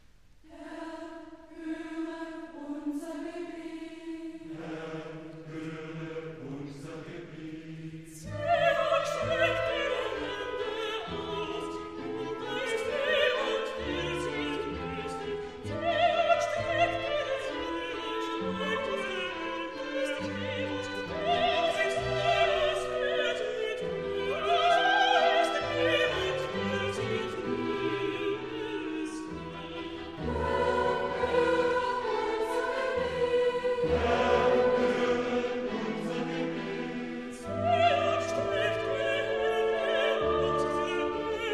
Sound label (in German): Duett mit Chor; Elias